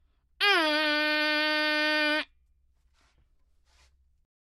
air horns

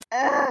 ugh x2

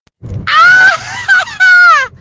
screm

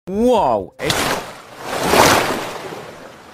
woah splash

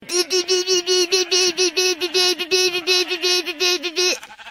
pipipipipipi

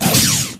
pistol shot

